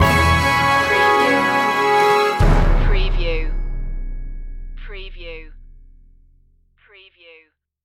nostalgia tv stinger

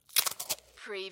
tape small roll pull off tape

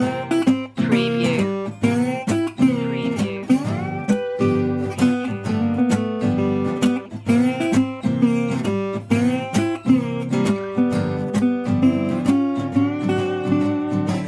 belly up blues loop

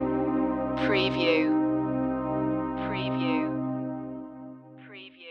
static games stinger

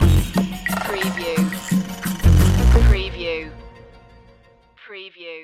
retro video game kazoo world stinger

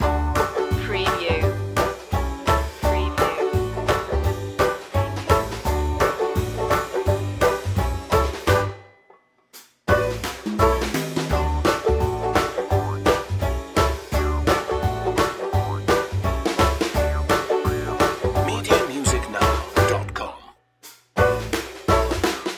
party barn banjo loop

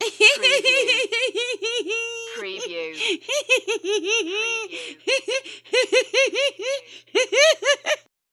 Lady Laugh Long

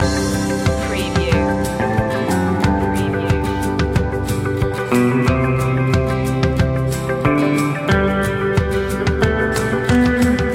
whistle along loop

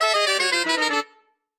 Music Saxophone Descend

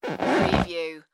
creaky floor

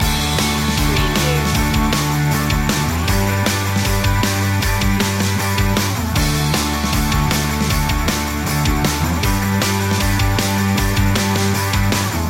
free flowing loop

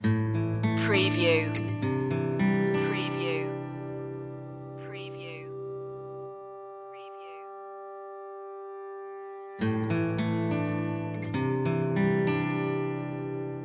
guitar only

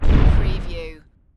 Explosion Short; Action, Impacts & Explosions

